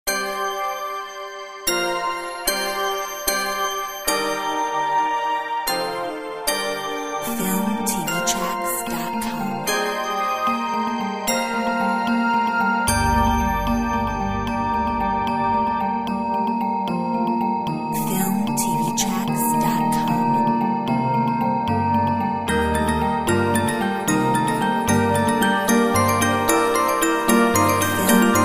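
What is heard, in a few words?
carol of the bells orchestra, 140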